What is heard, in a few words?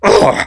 stun; sly